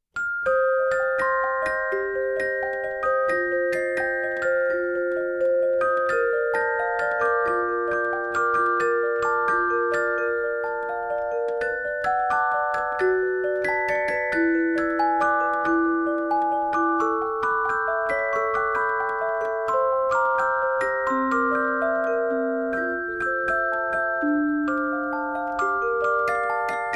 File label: Celesta